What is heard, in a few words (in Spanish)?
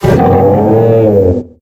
Grito de Heatmor